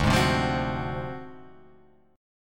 D 7th Sharp 9th Flat 5th, Guitar Open F; strummed; JGuitar

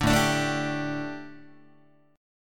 A 7th Suspended 4th down Guitar, chord; A7sus4 strummed

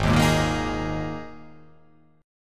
G sharp Suspended 2nd chord, down

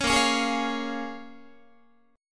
Bb Minor 7th, Guitar; Bbm7 strummed

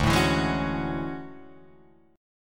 D Major Sharp 11th D Guitar Drop D

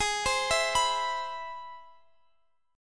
C Augmented Major 7th G sharp

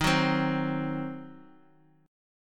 Eb Minor 6th, chord; Ebm6 strummed; JGuitar

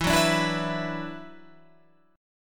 E 7th Suspended 2nd E down Guitar Standard; E7sus2; strummed

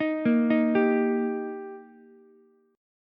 Eb Major, down, Ukulele; arpeggiated; JGuitar

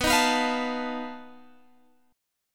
B 7th Flat 5th B Guitar Open G

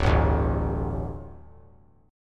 D Suspended 4th D; strummed

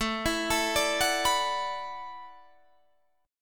E 9th Suspended 4th A, guitar

